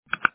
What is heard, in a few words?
click